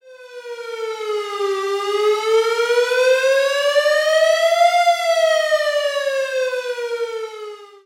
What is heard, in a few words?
siren